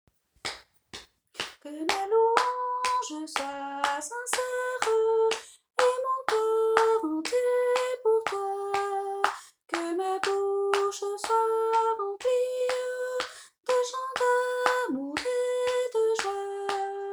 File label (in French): soprano